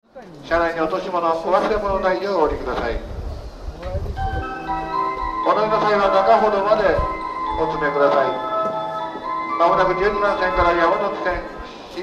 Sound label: shinjuku melody, Tokyo Train Tunes; japan